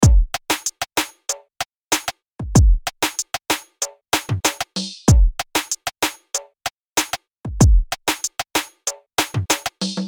Reggaeton 95BPM

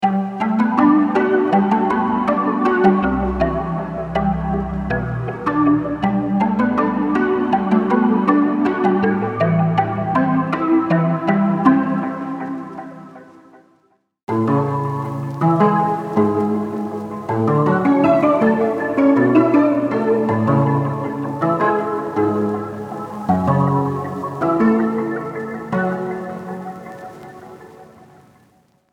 Melodies Lo Fi

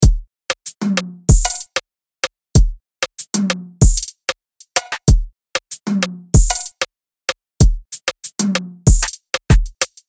Dancehall Afrobeats 95BPM

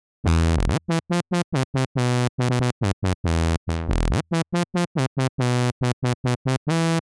Melody Aggressive

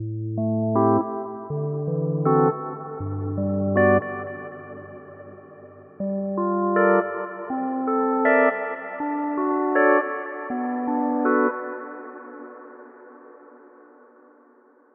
Jazz; midi wizard 2; Hybrid Standard

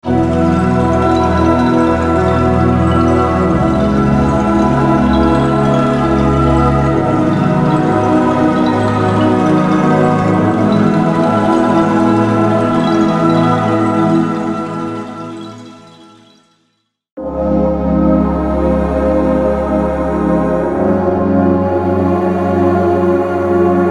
Ambient Downtempo

